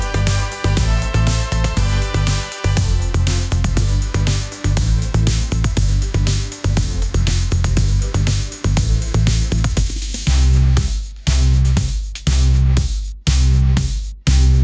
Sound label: upbeat pop melody